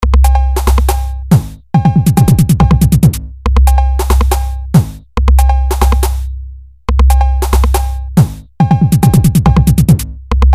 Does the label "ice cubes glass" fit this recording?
No